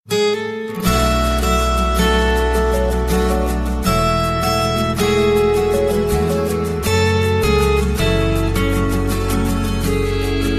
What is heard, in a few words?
ACOUSTIC GUITAR RINGTONE